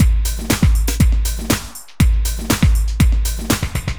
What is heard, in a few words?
drum fill